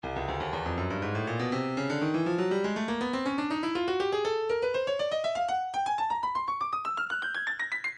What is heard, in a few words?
stylus breakbeat midi files 121 bpm; Microbrew F